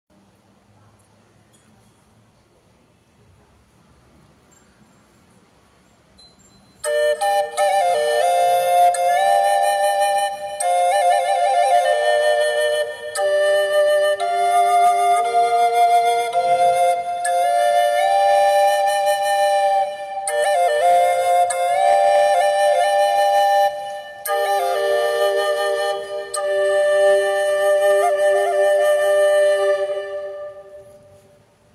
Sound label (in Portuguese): Flauta Tripla de Madeira Nobre Gm